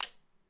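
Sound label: click button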